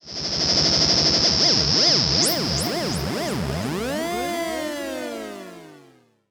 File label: Virus LFO Sweep